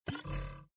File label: dice lose